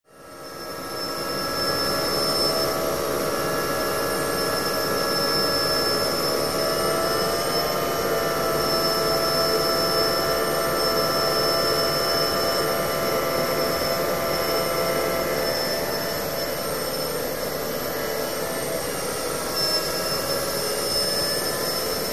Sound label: Machine Hum Whine Hig, machine drone